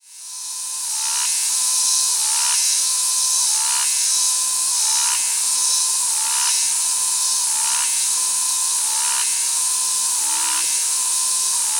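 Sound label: chorus and Neopsaltoda crassa, cicadas; AU; QL; McIlwraith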